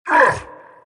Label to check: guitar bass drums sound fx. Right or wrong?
Wrong